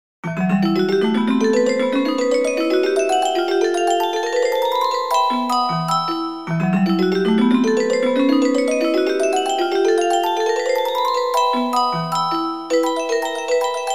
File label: fantasy dance